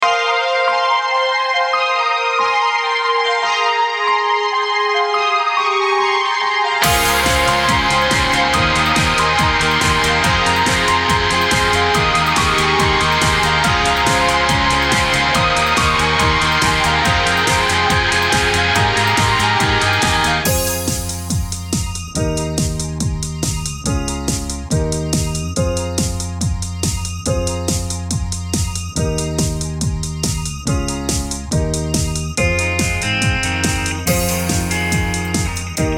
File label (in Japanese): 失恋、切なさだけが残るBGM BPM141